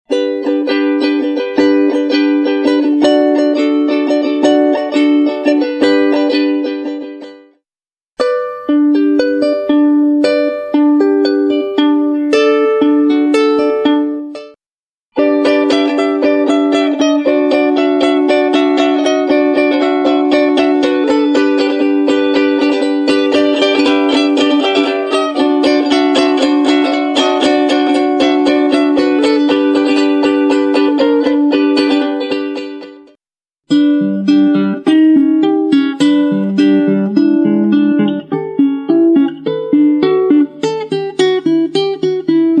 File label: uke